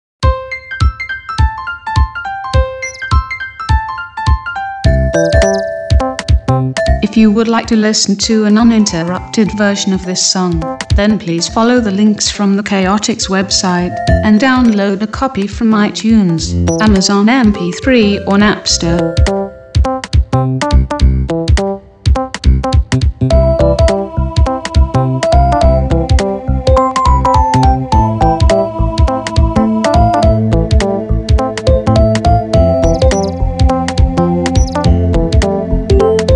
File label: Menu Song; nitrome; Chick Flick